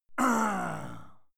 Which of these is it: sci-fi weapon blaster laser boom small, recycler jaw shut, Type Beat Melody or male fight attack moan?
male fight attack moan